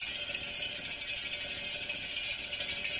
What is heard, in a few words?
respin reel spin; games; Aztec Gold Megaways